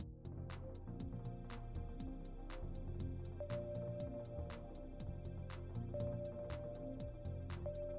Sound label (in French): essai musique